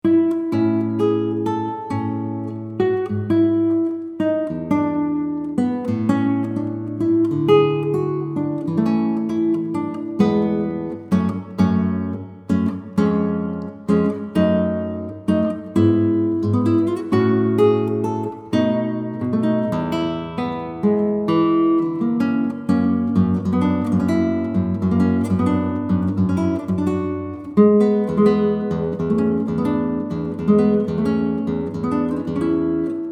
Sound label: rondeau, concert hall; carulli; rondeau op21 no1